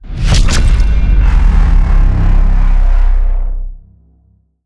UI Objective Failed; Serious Sam 4

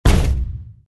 Hit; Fancy Pants World 1 Remix

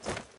Step Normal

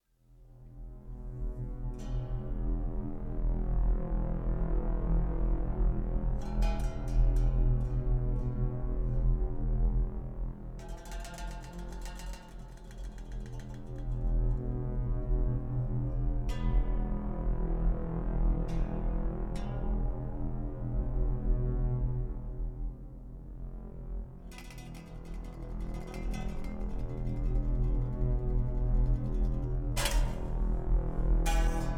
Music Gameplay State Neutral; Music Hideout4; H4 Level Area=First Office; Office confront

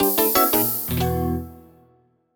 Jingle Gutter; Clubhouse Games: 51 Worldwide Classics

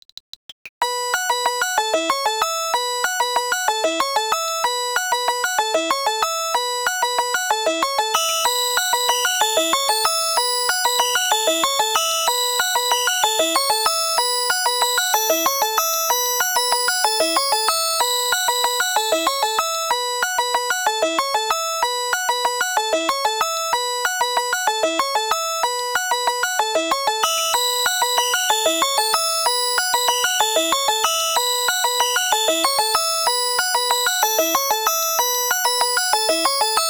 BGM Remix; Puzz Loop; puzz loop 2 cp system ii arcade gamerip 2001

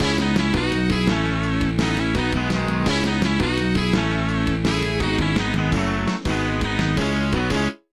Lose; Kamen Rider Battle Ganbaride Card Battle Taisen; nds

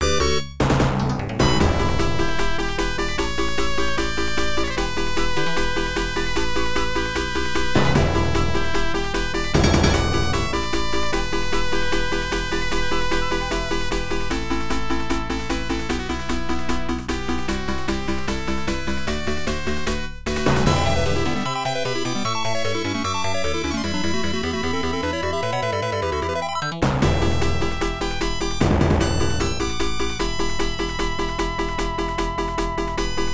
Battle; Maten no Soumetsu; genesis

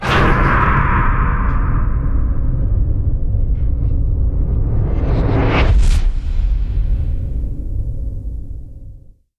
SFX Game Over; Legend of Grimrock 2012

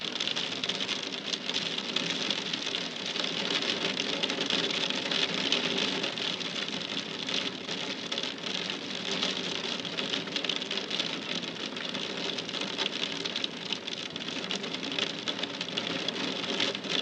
Rain on window loop; the 39 steps

